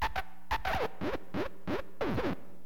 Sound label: SCRTCH, HipHop Fx; LiveAct Pool 1